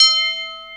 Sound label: TUBELL HI, TUBULR; GONGS+TUBULR